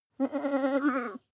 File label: goat baa muffled male